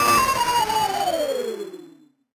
8bit sound

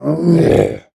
grunt

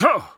Land

